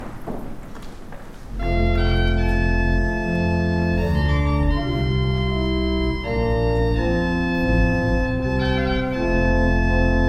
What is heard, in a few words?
Improv Allein gospel